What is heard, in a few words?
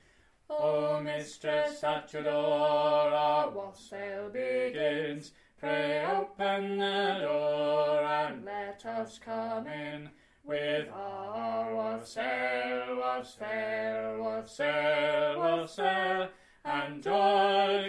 Wassail bass